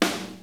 POWER SNARE, MPC-60 DRUMS; Greytsounds VOL-1

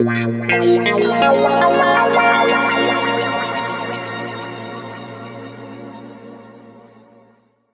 A# P-S WA -R, Guitar Samples; East West; Steve Stevens Guitar Samples Collection; PTCH-SHFT+WA

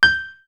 House Piano; X-Static Goldmine; backtothe70's

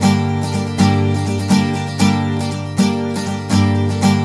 Blues Guitar Samples; ILIO; Fretworks

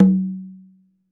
Tumba, Latin Percussion One Shots; Sonic Foundry (Sony Creative Software); Joe Vitale Latin Percussion

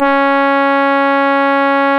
C#, Synclav FM, BRASS; Big Fish Audio

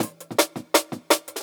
168, Jungle Breaks; Tweeter; Original Jungle Breaks

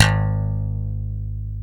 G#0 SLAP, SLAP BASS; AKAI S-Series CD-ROM Sound Library VOL-7; SLAP BASS 1

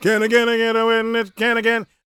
gospel male, vocal; ILIO; Vocal Planet

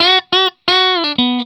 RIFF 120, Vintage Blues Guitar

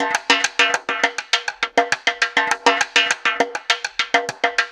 Snare Particle, Processed Drumkits

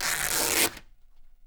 RIP, Canvas Bag Rips

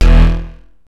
Synth Hit E

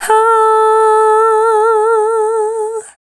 Dance Vocals, FEMALE

